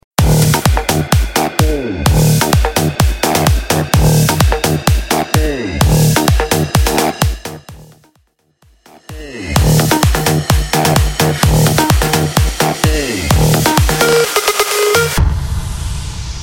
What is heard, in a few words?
EDM Mash Up; Mainstream VIP